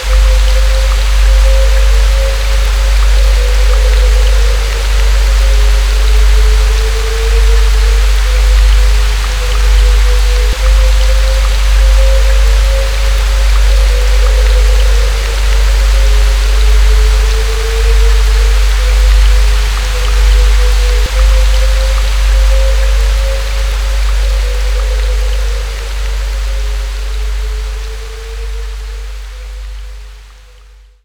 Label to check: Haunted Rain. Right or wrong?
Right